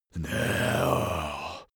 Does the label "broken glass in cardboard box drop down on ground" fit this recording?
No